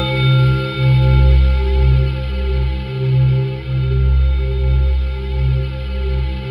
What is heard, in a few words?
C2, Synth Leads